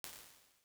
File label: Orch Room, impulse; UVR resources